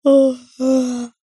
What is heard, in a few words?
human boy aged 9 yawn